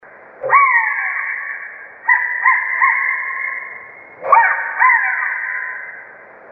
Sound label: turkey hunter